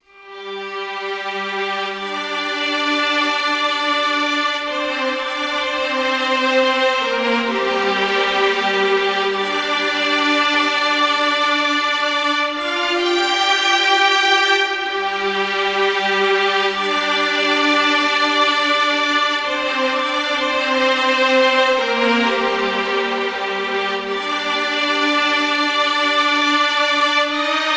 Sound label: Stringed Instruments - Ensemble Strings - Gm 65Bpm; Ghosthack; SkyRealm